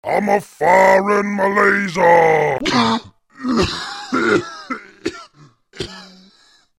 Braaagghh; Infiltrating The Airship

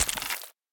Weapon Arm Grab; Pulling No Punches

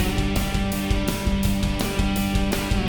Boss Fight Phase 2 MIXED

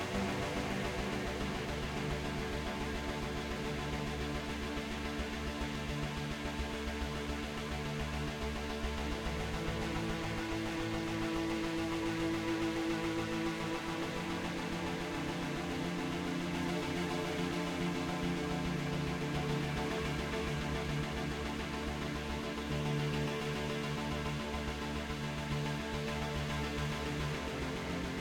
Music Tension Battle; nova 111; ps vita

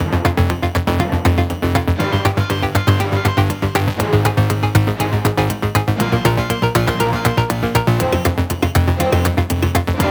Countdown!; super animal royale

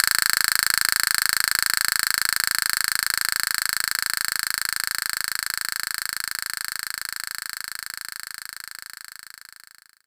Castanets

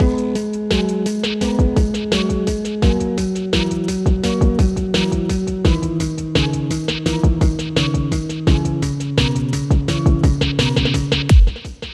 Instrumental; Epic Rap Battle; Friday Night Funkin Bambis Gauntlet ost extras mod windows gamerip 2023

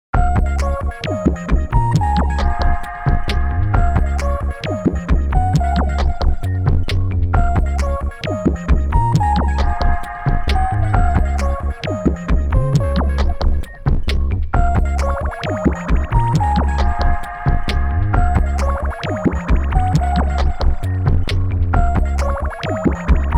Game Over & Continue; bomberman world

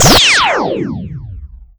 Fire Wand; Nicktoons Nitro; arcade

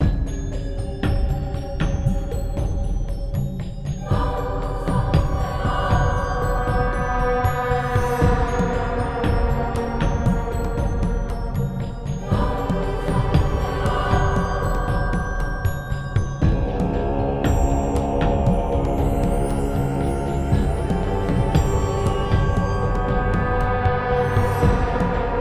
Ice Exploration; Ice Tier2 Exploration01

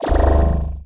BouncyBall, bouncy ball; mobile

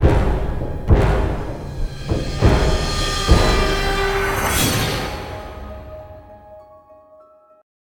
striking in the dark

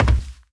Footsteps

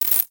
Coin Pickup

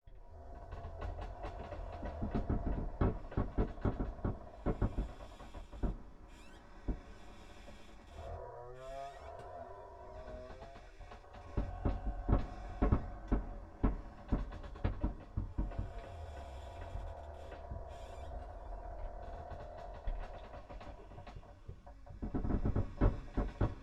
haunted house ambience wood creaks

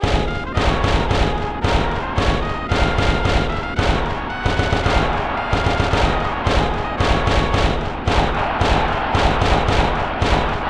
Pinch Hitter; Super Famista 4; SNES

